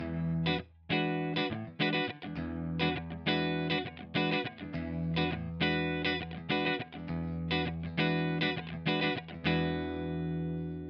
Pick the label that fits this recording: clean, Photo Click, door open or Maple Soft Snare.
clean